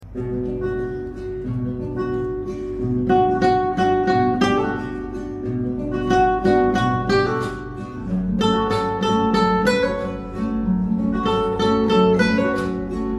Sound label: Guitar; نسخه بیکلام گیتار